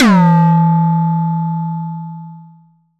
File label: Perc